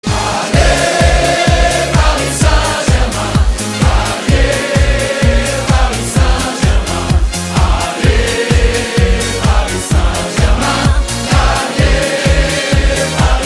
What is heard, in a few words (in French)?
hymne psg